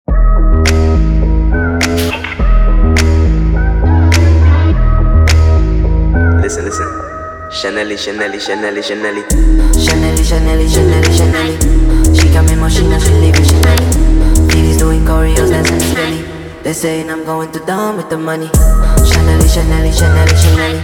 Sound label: sped up